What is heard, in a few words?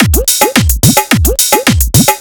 Dholki Beat